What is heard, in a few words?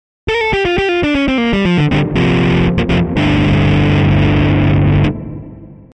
ampsim; SONAR標準 AmpSim; SONAR 2.0